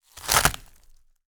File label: Ice, Creak, Sheet, Crunch, Step, Snow, Various; Mattia Cellotto; Crunch Mode